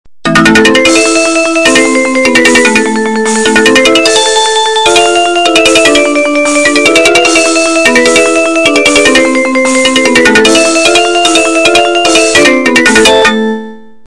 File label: xylophone; hevenu